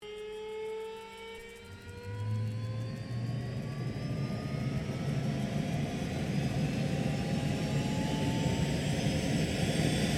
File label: AIRCRAFT CESSNA A-37B DRAGONFLY STARTUP, Jet Fighter /Bomber; Airplanes; Vehicles